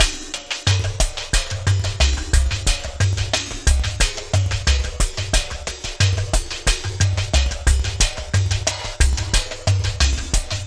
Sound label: alien tech down 1 octave; FRENETIC FRACTAL GROOVES